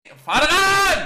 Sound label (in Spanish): grito; fargan